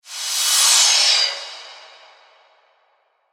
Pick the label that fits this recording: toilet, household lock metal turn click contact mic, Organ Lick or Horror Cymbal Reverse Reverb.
Horror Cymbal Reverse Reverb